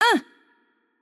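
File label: Hurt Light, Czech; bloom minigame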